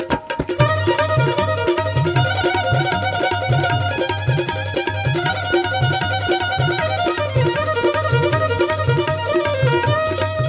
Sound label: Rings Sound